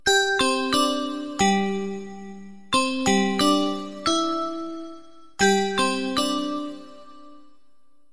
Chimes, ringtone; xiaomi

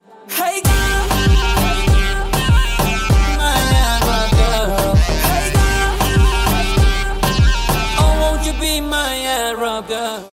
Arabic ringtones

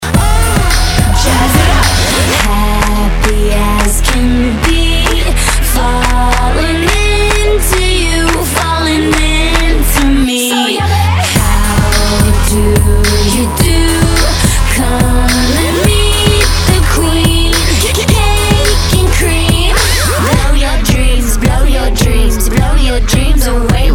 Birthday, ringtone

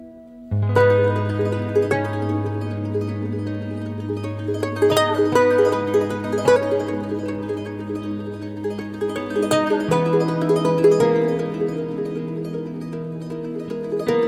ringtone

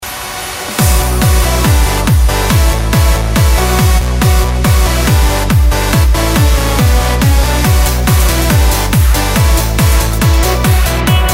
techno

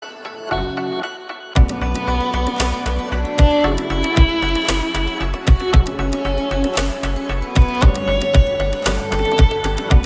Zen violin, ringtone; xiaomi

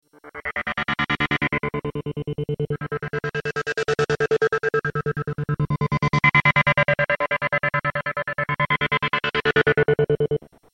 synth ringtone

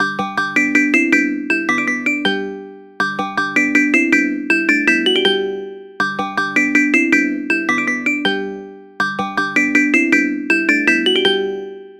Glockenspiel, ringtone; xiaomi

